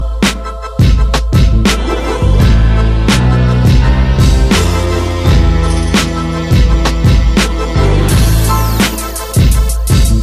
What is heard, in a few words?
Dope instrumental